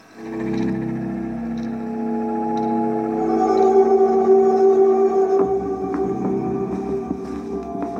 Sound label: ringtone; Doctor amelia crack